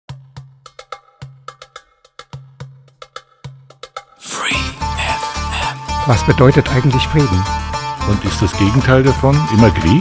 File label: Jingle peace on air starter